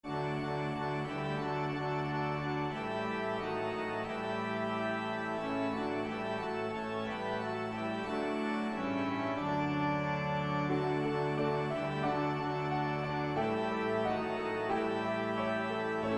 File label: Count Your Blessings easier piano